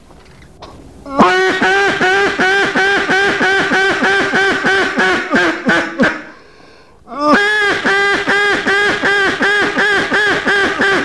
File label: Duck Calls, call only